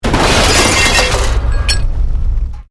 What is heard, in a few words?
Explosion Medium Metal Glass